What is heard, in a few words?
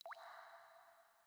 water drip light; Rust